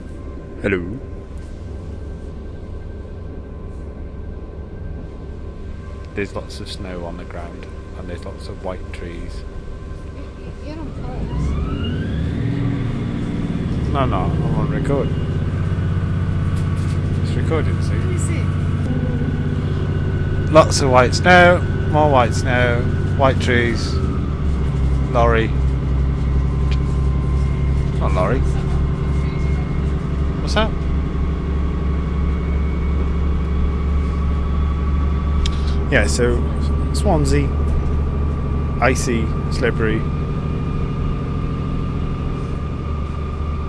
train sounds; barrie j davies

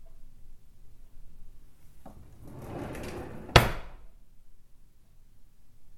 shut kitchen drawer

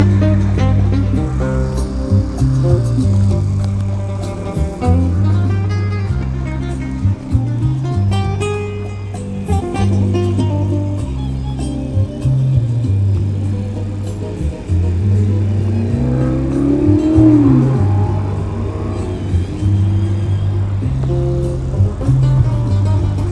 guitar at the brandenburg gate

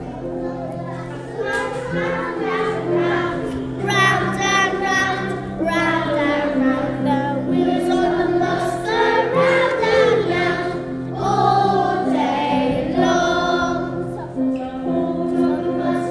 Y2 singing

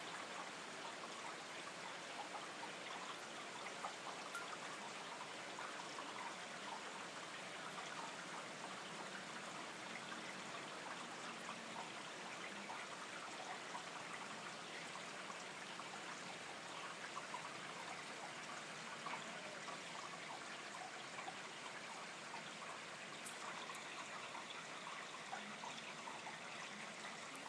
rain at night

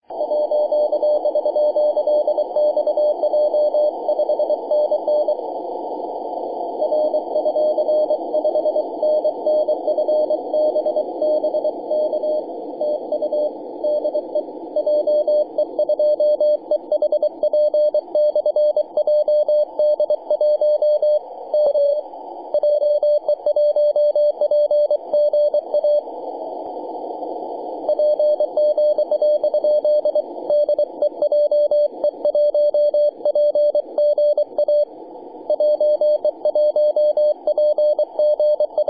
10.122mhz cw

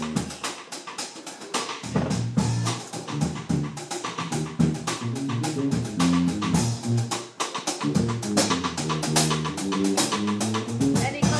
jazz; helsinki

